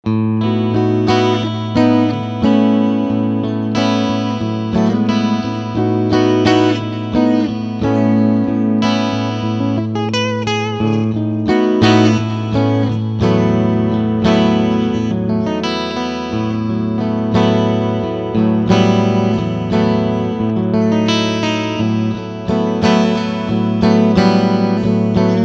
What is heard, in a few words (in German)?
Beide PUs Clean